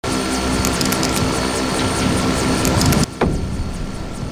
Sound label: Xpanding Foam; Infiltrating The Airship